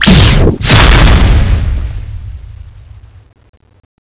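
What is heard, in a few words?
void pistol blackhole explosion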